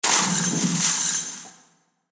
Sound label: Shattering Fantasy Explosion, magic; Magic Sound Effects